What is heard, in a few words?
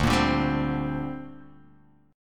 D 7th Suspended 4th Sharp 5th, down